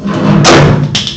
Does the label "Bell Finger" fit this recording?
No